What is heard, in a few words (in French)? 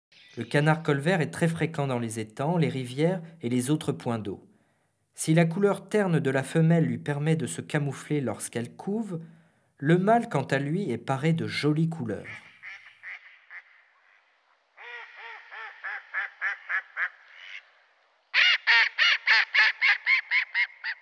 canard colvert, oiseaux